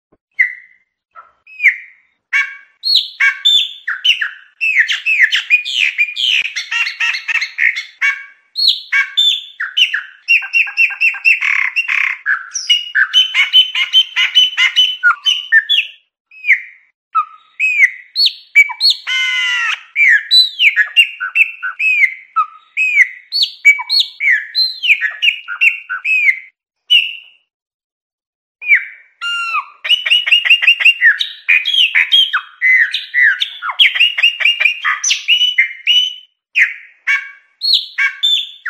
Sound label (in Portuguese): toque celular passaro preto